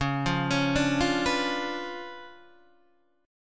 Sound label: Db 7th Flat 9th, Guitar, Open D